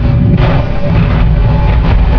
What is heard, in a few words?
heavy move; XDM